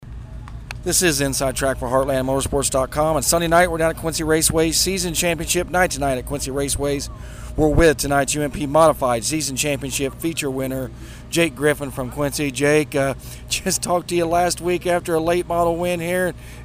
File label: UMP Modified; Season Championship; Quincy